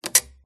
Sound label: Stress Creak Squeak Groan; PMSFX